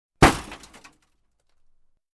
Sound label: Wooden Door Slam